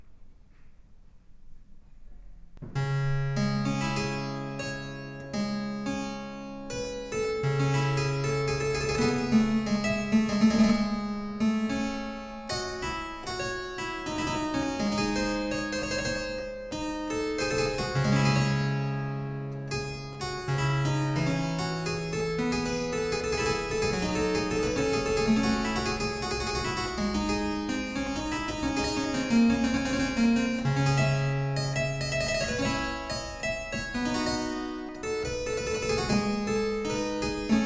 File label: pavana lachrimae, harpsichords